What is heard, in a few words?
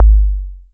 Tom - Low Tom, Wiard 300-Series Modular